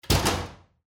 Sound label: door slam